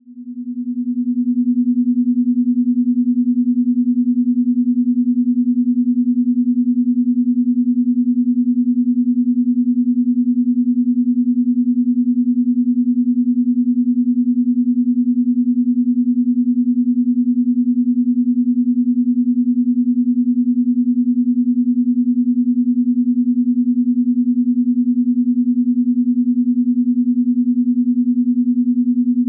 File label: binaural